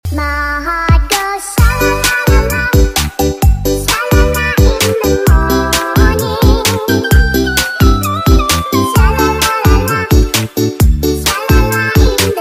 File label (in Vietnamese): Nhac Chuong Remix Cute